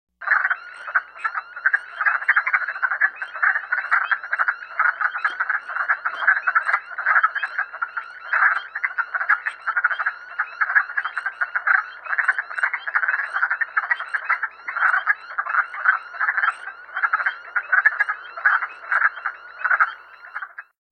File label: Wood Frog call